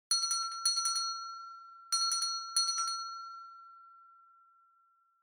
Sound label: Winning Bell Gentle